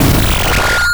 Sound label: Hit Distortion